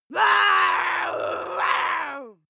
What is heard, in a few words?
witch yell